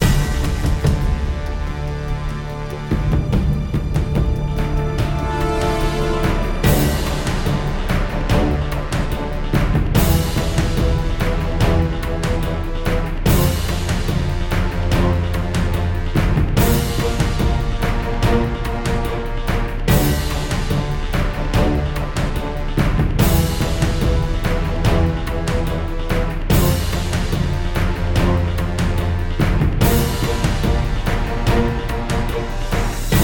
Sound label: stock music